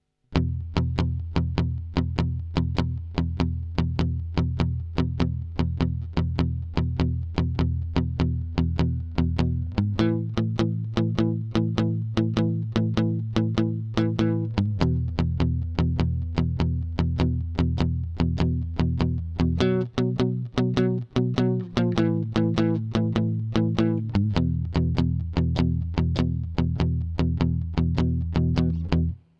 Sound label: blues prog